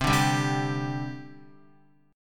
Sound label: C Suspended 2nd, C, down, Guitar; Csus2 strummed